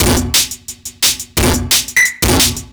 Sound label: Thin 175, Breaks, Drum&Bass; EZ Rollers